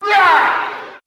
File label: growl, Voice; ooh ahh